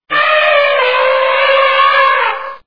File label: elephant